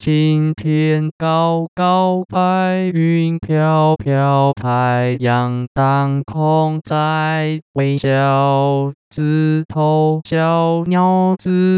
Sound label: Happy song, male